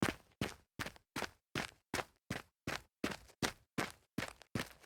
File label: dress shoe jog on gravel